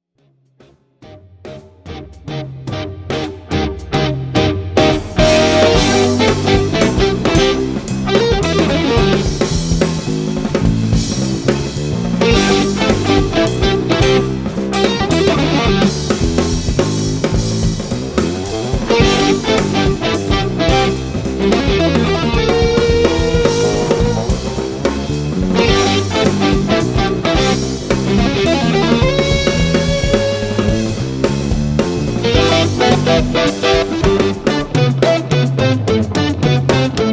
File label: Waltz; D Flat